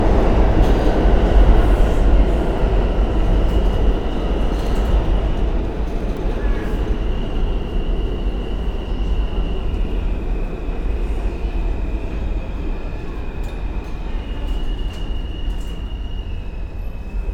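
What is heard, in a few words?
subway station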